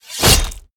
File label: Whirlwind Strike; Idle Champions of the Forgotten Realms; Warrior